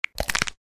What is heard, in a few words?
crack; 1casino new years dragon